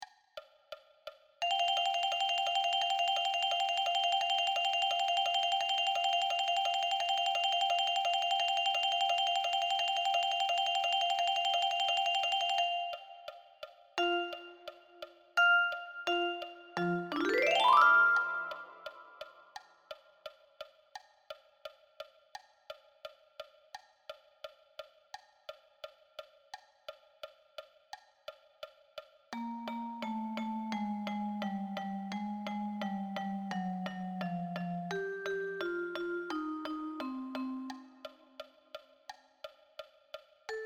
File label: Vibraphone